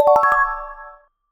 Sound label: Charging Started Fast; Samsung; Galaxy S25 Ultra; ui